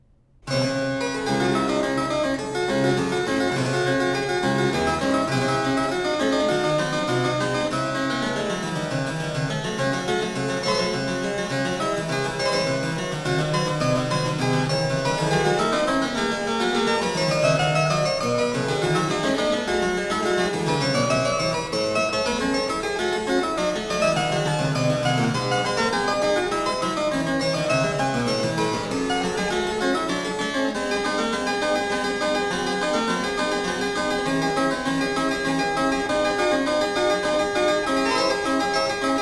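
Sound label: Prelude 6 in D minor; BWV 875; Bach - WTC - Tilney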